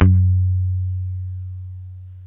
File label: ROCK, PICK BASS